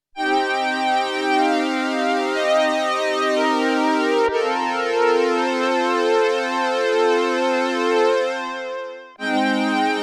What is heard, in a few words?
STRINGS; Factory